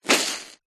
household sponge large wet soapy foamy squeeze squelch; zapsplat